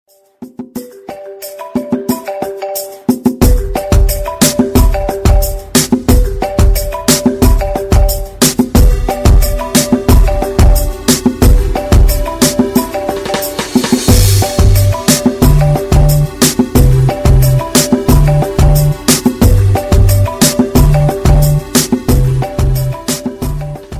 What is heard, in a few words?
iPhone Effect